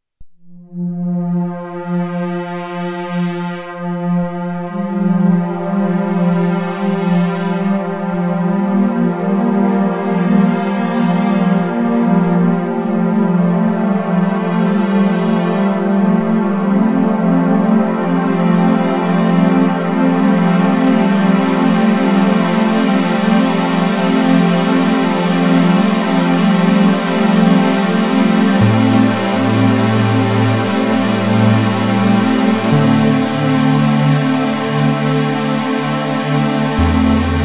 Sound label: Pad